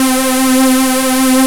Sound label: PULSE NOISE C4; Best Service Alpha Dance vol.2